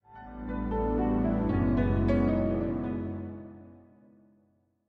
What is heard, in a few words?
Completed; Rocksmith